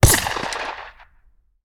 Bullet Impact; Sound Ideas; Guns

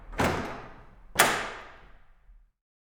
Wooden Window Shutters; Inquisitor 2

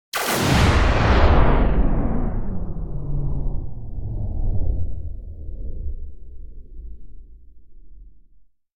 Whoosh Sci-Fi Weapon Blast; Hollywood Edge; sound designer's toolkit v2

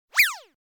cartoon zip; NCH Library

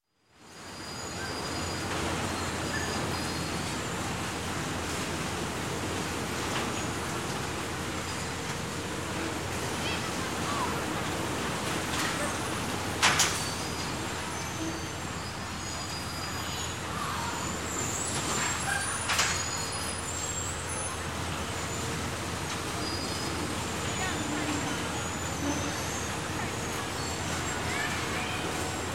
Bumper Cars Bumper Cars Squeaking Around Track; Fair; Sound Ideas 2000 Series

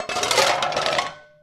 Dishes Pans Rattle; Foley - Rattles

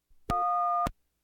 Household Phones

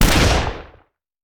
Rifle; Sound Ideas; Guns

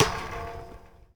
Street Ball Metal Back, Street Basketball; SFX Kit

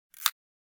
camera; NCH Library

